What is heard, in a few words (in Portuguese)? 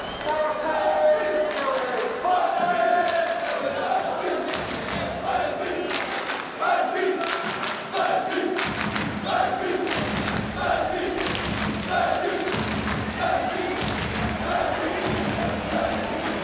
Cantico diabos, Chants, lisbonne